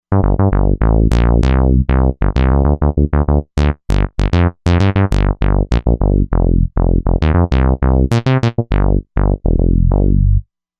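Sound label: Bass Dry Synth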